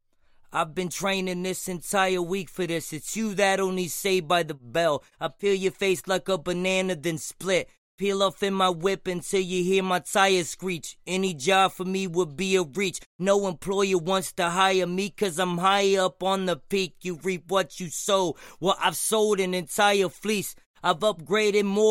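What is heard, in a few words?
hip hop 136 G major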